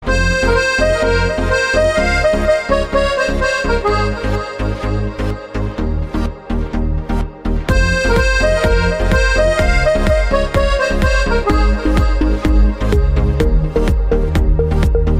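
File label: nokia accordion ringtone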